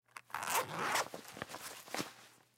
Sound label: Pool Cue Bag Unzip, Pool Cue Bag Unzip Cue Carrier; SPORTS; SoundEffects-Blastwave